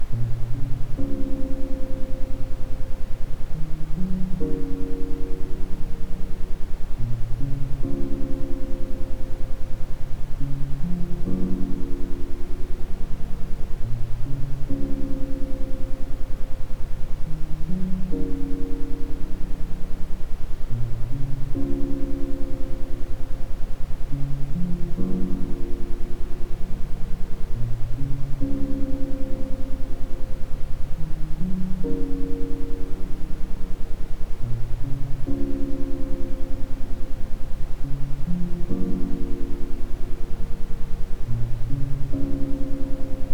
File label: isochronic, Ambient Sound; Gesundheit Heilsam Quickie